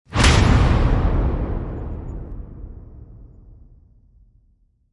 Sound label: Concussion Hit Epic; Ninja Tracks